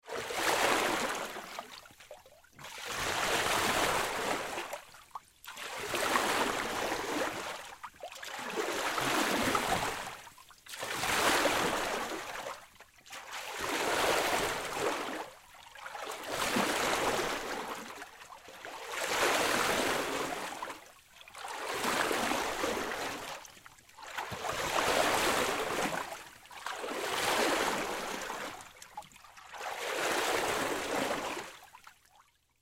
Splash Waves Pool, Water Splash Waves Pool Small Plastic Children; Splash Sound Effects; Splashes; Blastwave